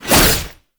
Naginata Whoosh, Weapons Melee; Warframe; Koumei Naginata

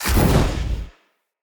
Casting Device Cast Left; Fenn

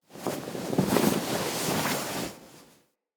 Tent Cloth Rustle, Interior; Soul Plane

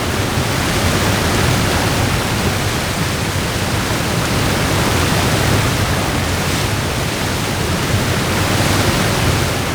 Water Fall Medium Loop; Warframe; Duviri; Ambience

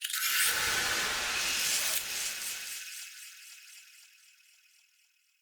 Blight Arm Activate; Weapons; Arms

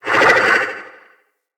Corrupted Slug Vocal Pain

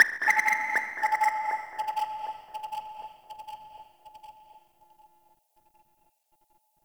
Grineer Frogman Attack, Vocals; Warframe

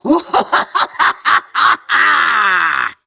Laugh; Warframe; Grineer Catbrow Handler; Battle Barks

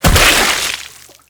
Enemy Ink Explosion; Shadowgrapher

